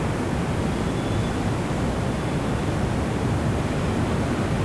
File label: city; dangel